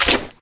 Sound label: paint, footsteps